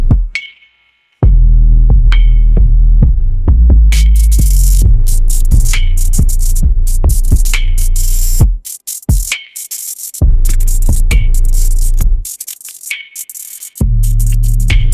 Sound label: ringtone hiphop